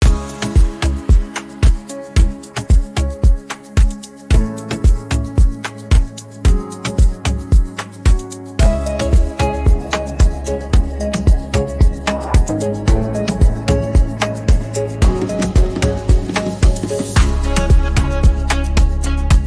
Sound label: beat